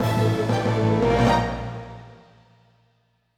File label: Event Tension; The Nightmare of Druaga Fushigi no Dungeon; PS2